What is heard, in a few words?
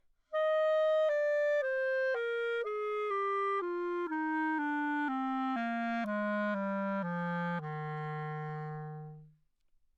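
legato descending, romantic clarinet bb, Anechoic; OPENAIR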